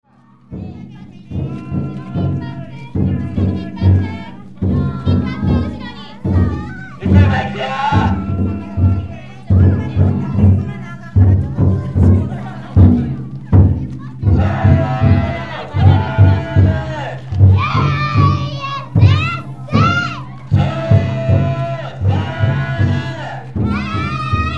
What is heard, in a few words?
taiko